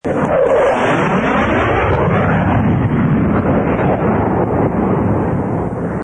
f-106 fly by; bombardiro crocodilo

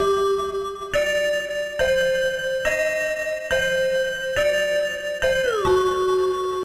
phase 1; Garry's Mod; sprunki jelly fish

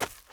step dirt; incredi pack horror

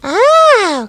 hunter stunned; huggy wuggy snpc; elmo

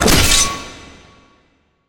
dolphin hit; zoochosis; lazynap; dolphin deepsea monster

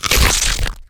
infector bite; blizzard spawn

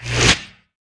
painkiller spoon back; dumpster baby titan; ButcherExe

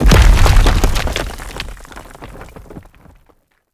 rock impact big; skibidi critical dumpster; Skibidi OCTOPUS

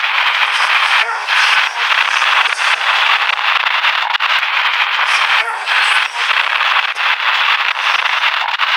static; Garry's Mod; huggy wuggy snpc; slender; corrupted woody

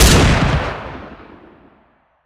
fire; squid game pro jjk; squid game doll

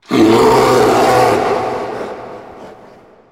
bear sound; zoochosis; lazynap pack 2

